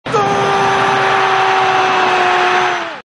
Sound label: Goal Sound Effect Notification